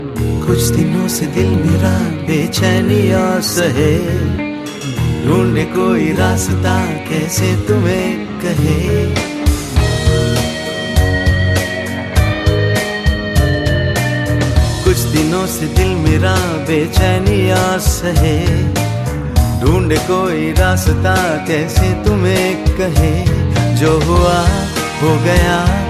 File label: New Bollywood Ringtone